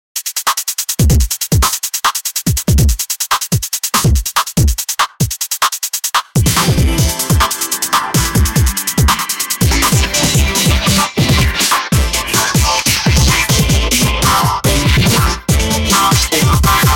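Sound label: dj transition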